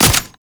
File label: Alt Fire Mech; Warframe; Weapons; Tenno; Oraxia Flechette